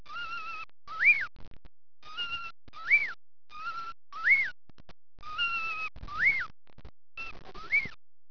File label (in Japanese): nakigoe